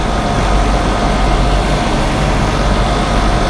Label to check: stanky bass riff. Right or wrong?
Wrong